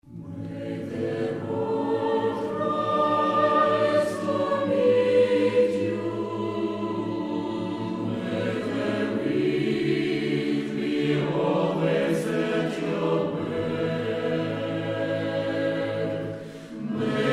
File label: irish, choir